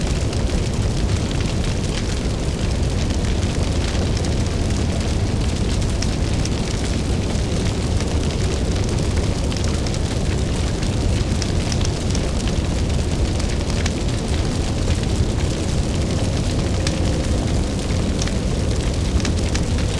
ambience burning fire

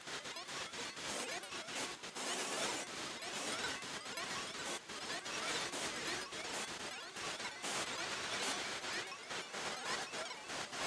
Amb Purple Loop

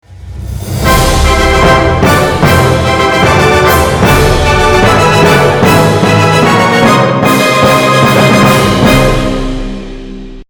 Jingle Win; the legend of santa

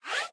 hookshot Zip In; unsighted

